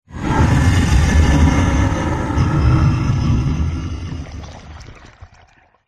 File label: horror monster swamp huge menacing growl slimy bubbles